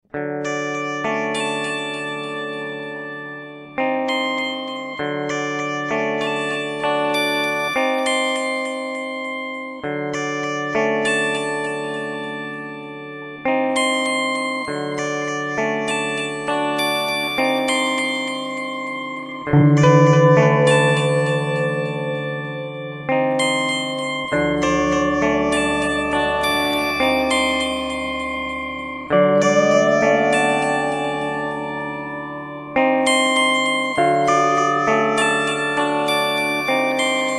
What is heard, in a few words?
Meris Hedra